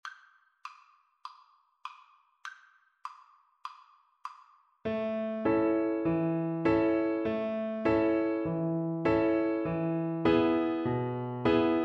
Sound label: big ship, Violin